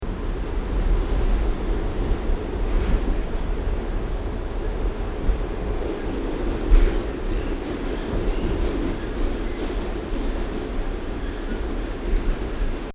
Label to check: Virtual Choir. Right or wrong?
Wrong